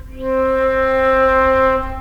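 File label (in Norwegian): fløyte low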